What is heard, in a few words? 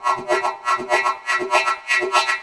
Echo Chuff, Synthetic Pulses